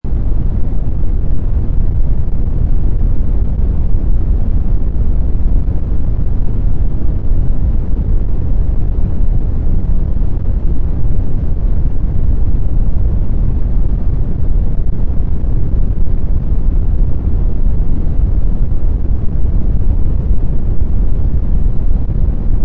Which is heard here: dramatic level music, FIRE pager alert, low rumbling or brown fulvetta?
low rumbling